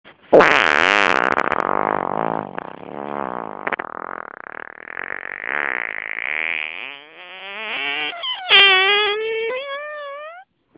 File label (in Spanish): Pedo fresco